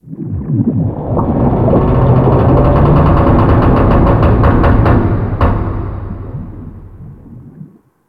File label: Ocean Hull Creak; Ambience; Warframe; Grineer Ocean